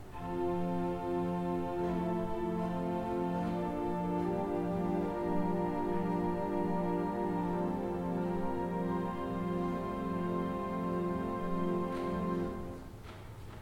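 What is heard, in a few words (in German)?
Orgel, pfeifenorgeln; Conacher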